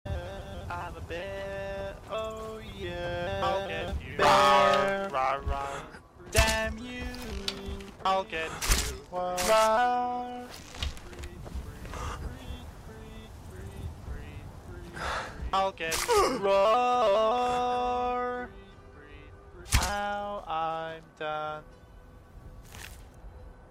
autotune bear; skyrim

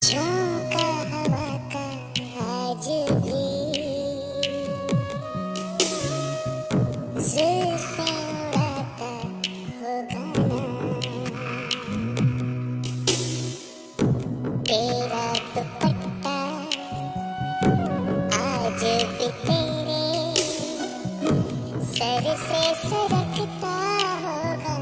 chipmunk; blues

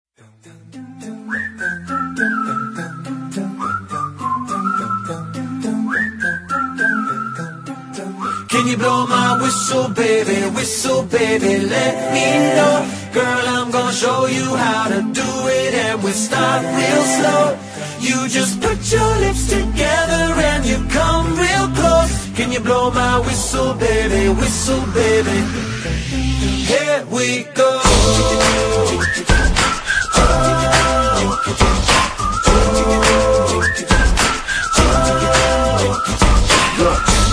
whistle

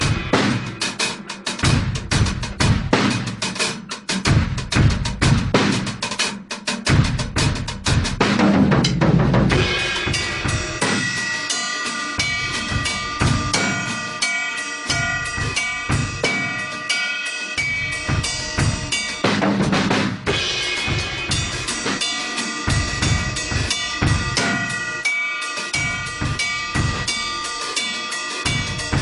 Blues Drum

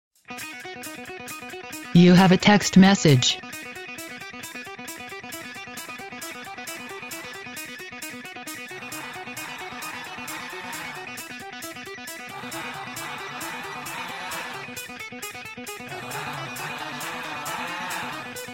rock sms

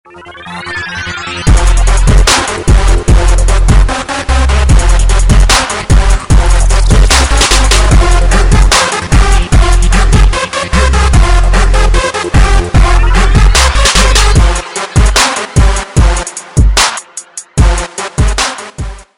hip hop beat

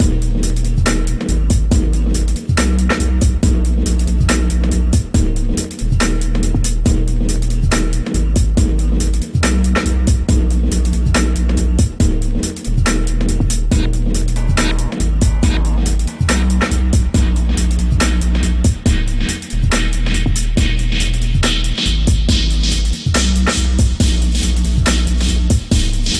Chilled Dubstep

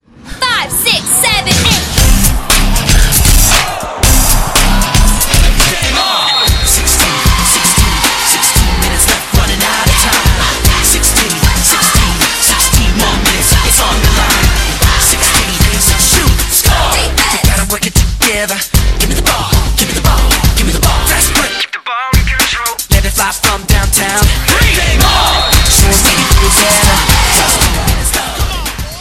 high school march; classical

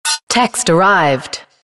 text message arrived

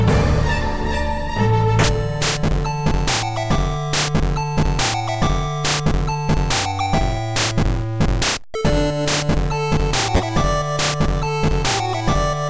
8 bit; ringtones; star wars

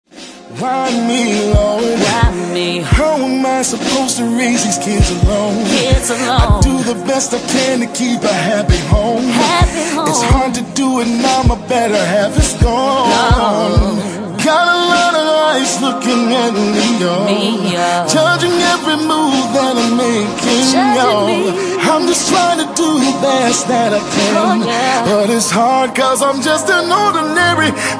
gospel single

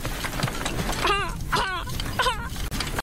Laughing

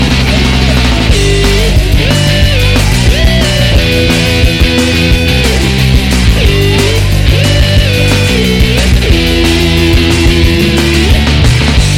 Crazy Guitar

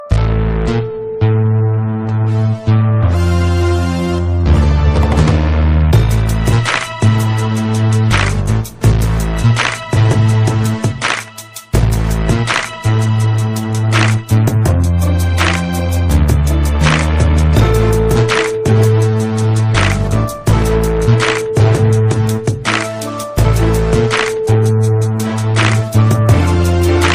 black beat, hip hop

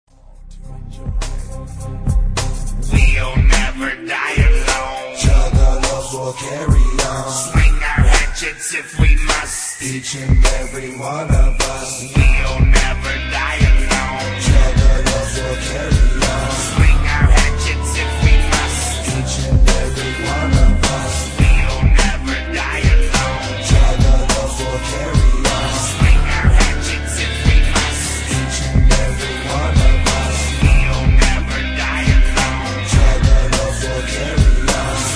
juggalo chant

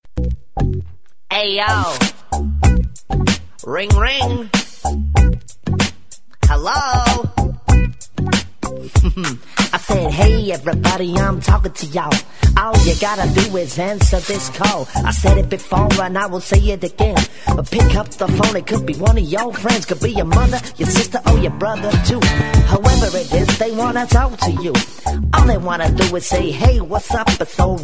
hip hop bit, ringtones

